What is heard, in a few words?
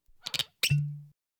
Household Phones